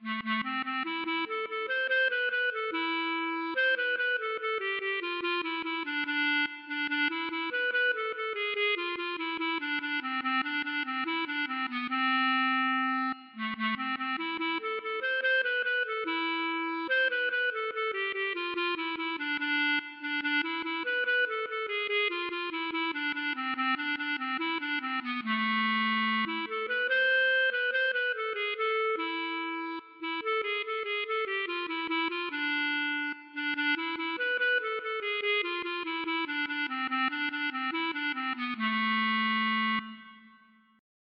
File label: klezmer